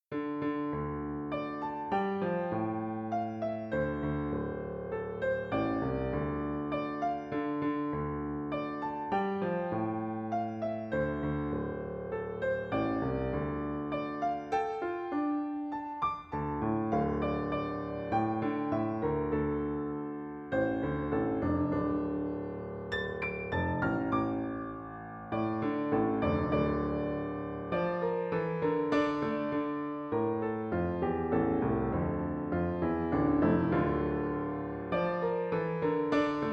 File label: Soliloquy for Piano